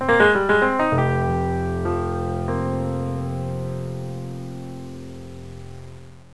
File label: Piano Pause; Curse of Dragor